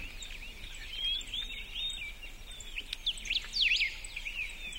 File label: bird trill